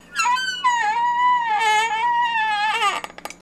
Chair Squeaks Moans

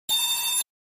Alert Beep Sci Fi

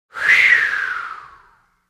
Ind Gust Wind; gusts

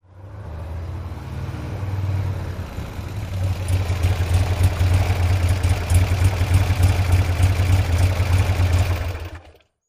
harley drive up, harley-davidson

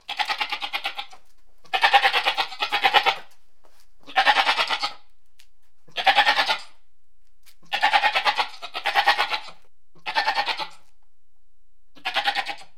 Goat Multiple Bleats; goats

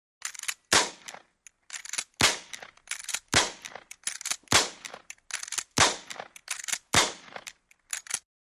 Old Rifle Rapid Fire, repeating rifle exterior; WEAPONS - RIFLES REPEATING RIFLE: EXT: Civil war repeating rifle

